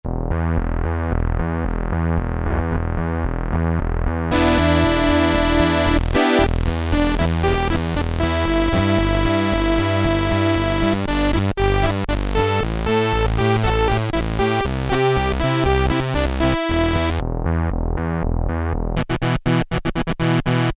Korg Poly-800, Synthe Bass II

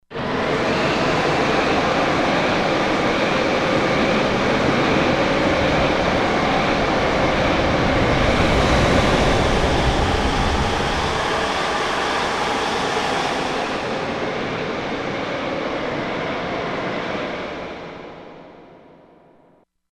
Storm C5; Environment

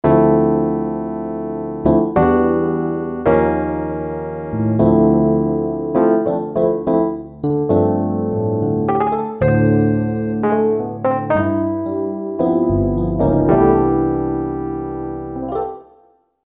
60'E.Piano, Generalmusic Equinox

